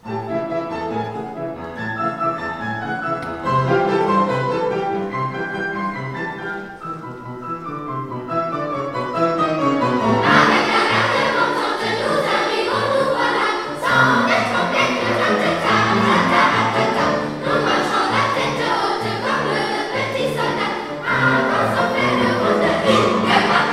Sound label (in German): Unterstufenchor Carmen